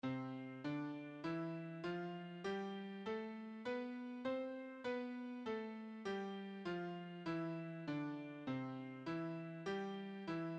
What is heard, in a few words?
tuneup C Major; madrigals